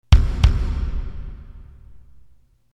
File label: Stage Alert; psychic storm; turbografx 16